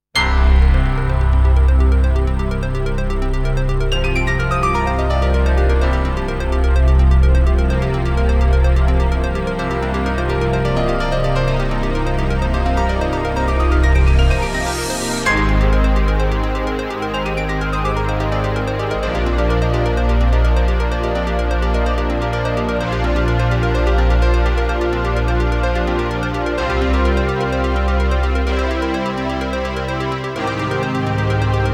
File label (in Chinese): 深沉的背景音乐; 3分以内